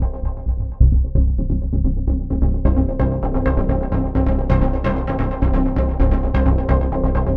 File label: NRG Arp, trance